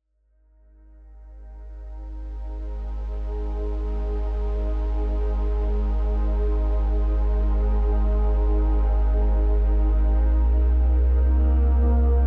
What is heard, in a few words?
Love Frequency Soundscapes; TwinFlameGuides